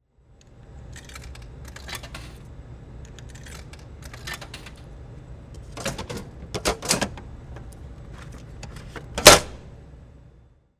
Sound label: food vending machine